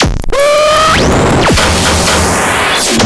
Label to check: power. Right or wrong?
Right